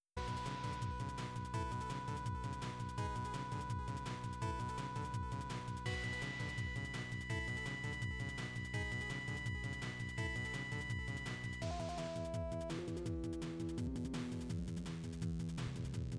music; cave story

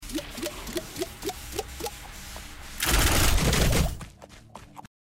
shot; risk of rain 2

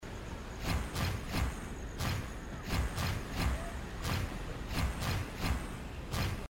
attack; hyper light drifter

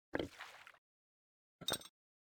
pick up and drop potion; baldursgate

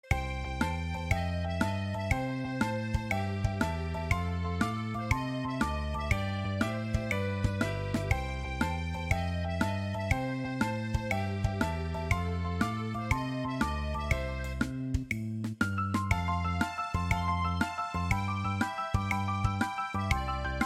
egg festival lied; stardew valley; egghaunt

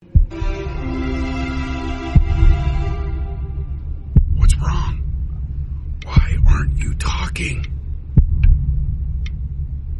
heartbeat; before your eyes

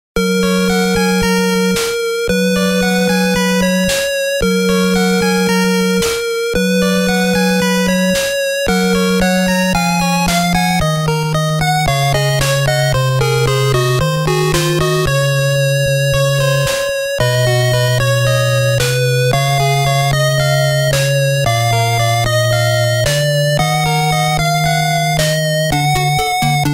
mmsoundtrack; Mega Man 2; gamesoundopedia

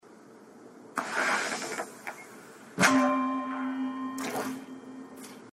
shooting button; Titan Souls

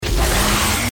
spell flying projectile; baldursgate

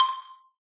brick combo; candy crush saga online

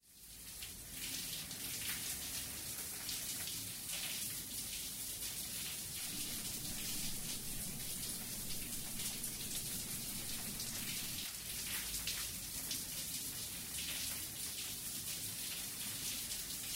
shower; Life is Strange 2; gamesoundopedia

